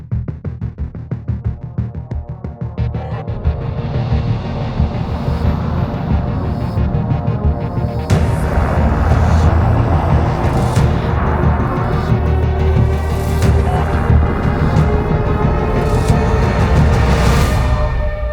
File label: Cinematic Cue; Warframe; Deadlock Protocol; Parvos Reveal